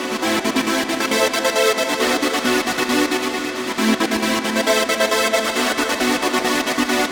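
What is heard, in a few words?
Synth 135BPM F minor Chord Pad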